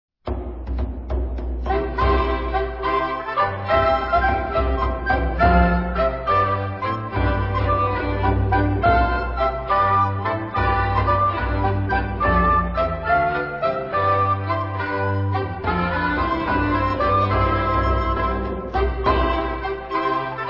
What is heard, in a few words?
courante